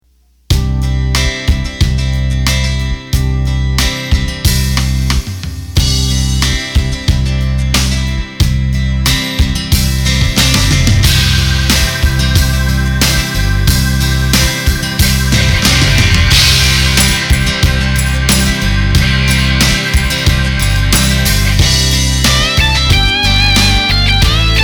8 Beat; roland bk7m

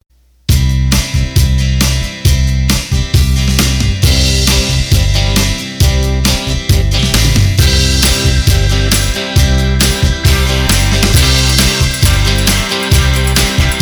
Country Rock, Roland accordion; roland bk7m

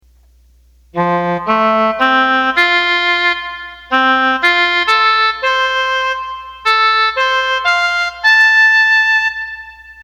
Oboe

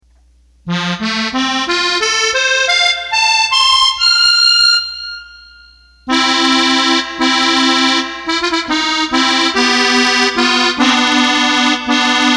Accordion

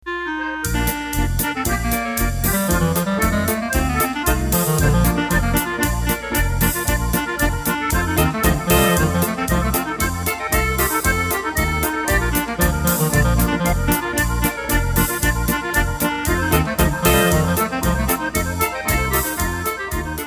clarinet polka

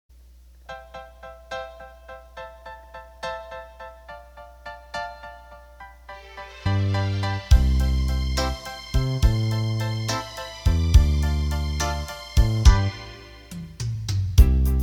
Slow Rock, accordion

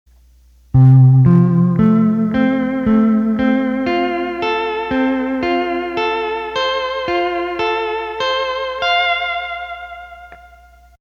Hawaiian Guitar, accordion

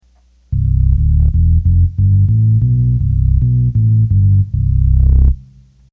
Bass Organ

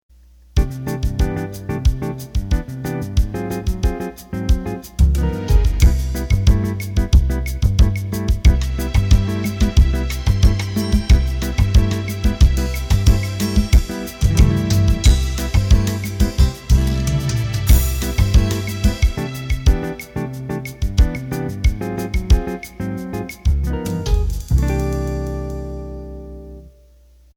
Bossa Nova Fast

